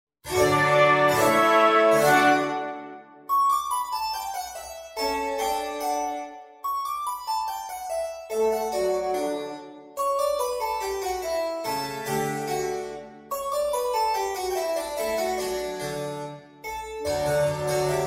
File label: 3 Harpsichords; Masek